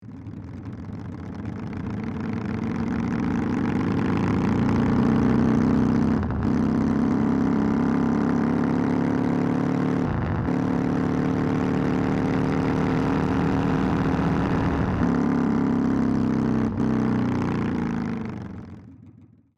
Harley davidson motorcycle driving and accelerating